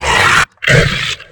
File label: golem charge